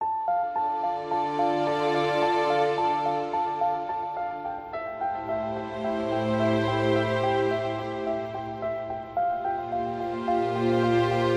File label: Political Documentary Background